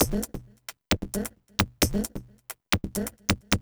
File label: House Perc, Dance Beats